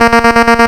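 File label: Sawtooth Tremolo A#2; Ableton Foundation FreePacks; Foundation Glitch Toys & LoFi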